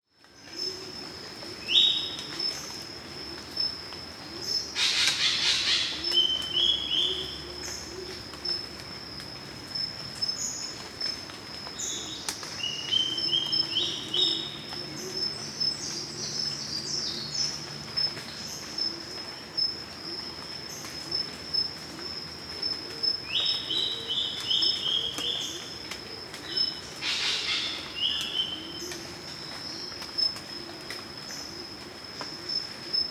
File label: Spangled drongo; پرنده بوجانگای پولکی